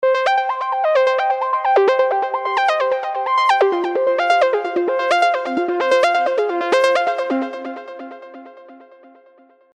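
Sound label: arpeggio, sylenth1; A Must Have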